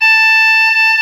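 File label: A5 hard vibrato, oboe; Northstar - New Gold VOL-2; EBONY OBOE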